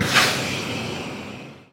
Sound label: valve; rigs of rods